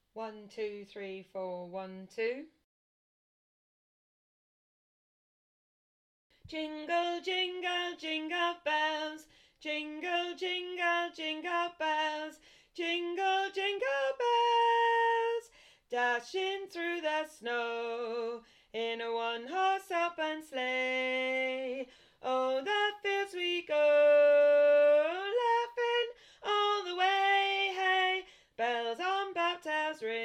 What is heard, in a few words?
SOPRANO